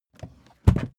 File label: suitcase swing case close